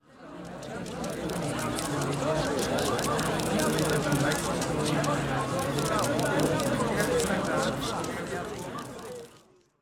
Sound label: chattering, ringside; HeavenStudioPlus